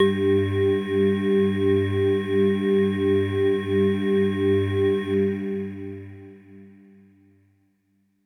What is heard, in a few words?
Hello Kawaii G1